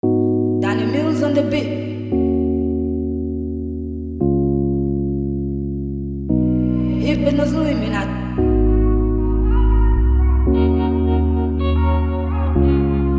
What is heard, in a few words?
Afrobeat instrumental; Dannymillzbeatz